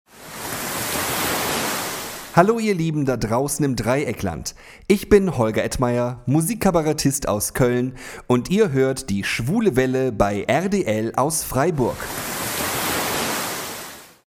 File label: Jingle